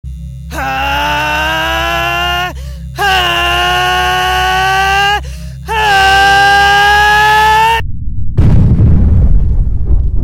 Smash; Station Vault

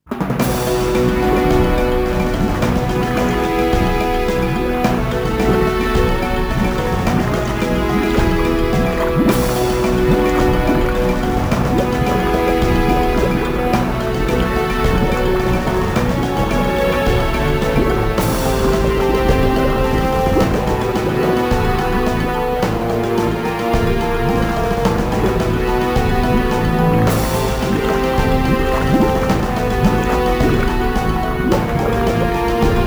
Lava Cave (With SFX); Dokapon Kingdom; Wii

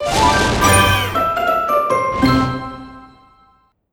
Crunchy Finish

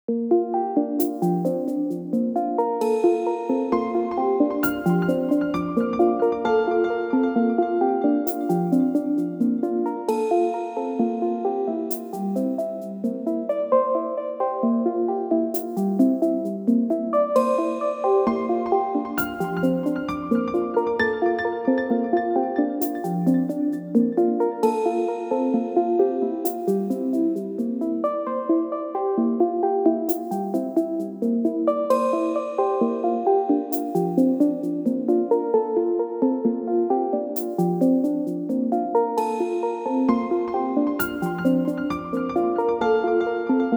Notifications; Gamepad

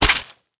splat juicy wet

